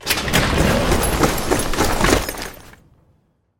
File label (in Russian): pogrom na avtosvalke